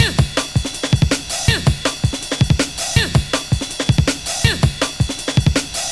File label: 162, breaks; M8